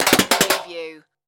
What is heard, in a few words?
Bullet Machine Gun Impact Metal